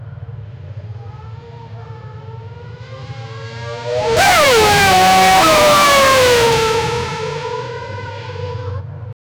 f1 engine roaring

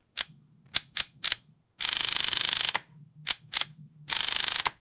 the sound of a profession, camera shutter

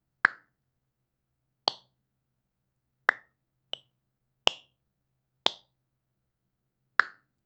comedic bonk sound in a slapstick scene; cartoonish

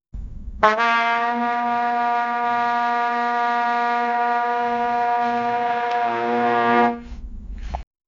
the voice of trumpet blow, brass instrument

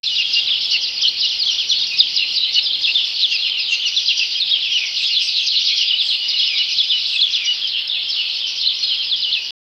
sparrows are chirping in

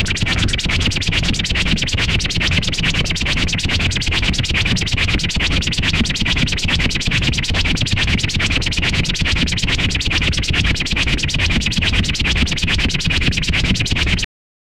acid bubbling loop, chemical

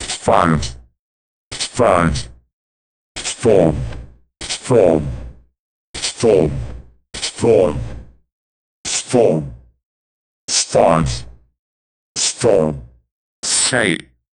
create a rocket countdown

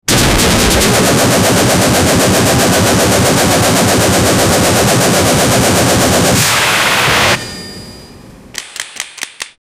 ak 47 firing sound

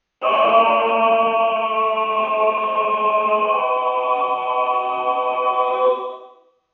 man 60 year died, death

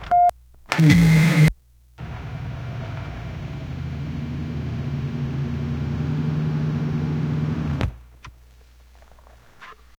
a deep rumble as the

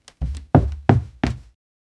footstep on wooden stairs, indoors

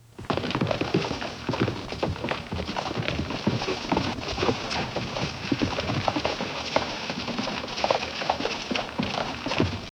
wildlife

